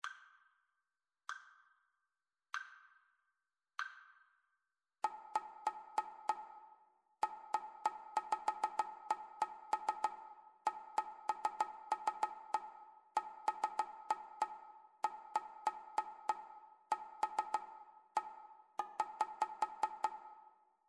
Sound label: rhythmic dictation; ear training